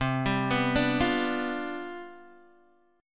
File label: C Major 7th Suspended 2nd Suspended 4th C down Guitar Open G, chord